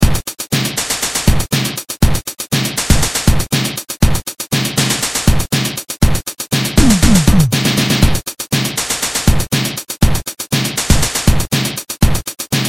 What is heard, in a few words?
BEAT Drum and Bass; Rhythm Core Alpha 2